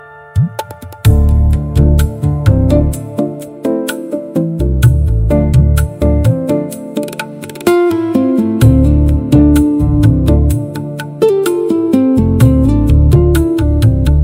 ringtone

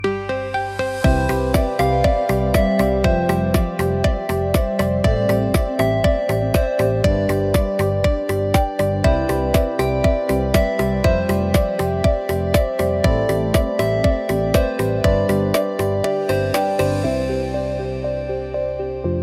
indian melody ringtone

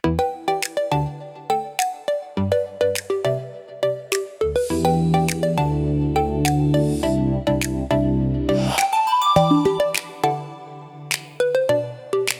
ringtone, indian melody

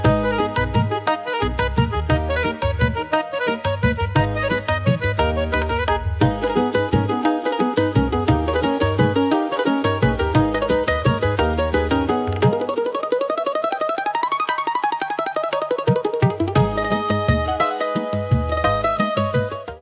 ringtone

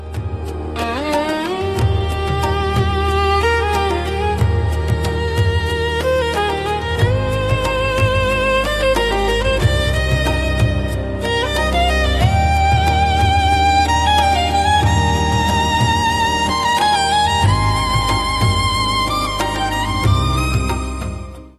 indian melody ringtone

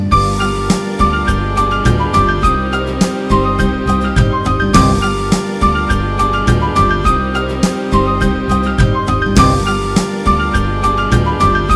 dhadkan ringtone, indian melody

